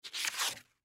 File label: Sand Paper Pick Up, Sand Paper Pick Up Piece; Sanders (Sound Effects:Machines); Blastwave